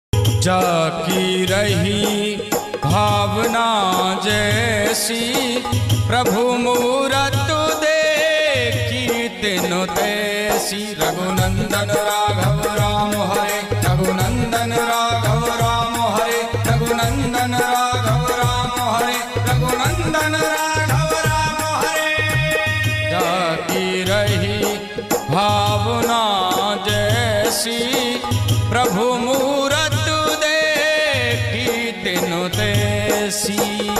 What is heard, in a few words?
Jaki Rahi Bhavna Jaisi Prabhu Murat Ringtone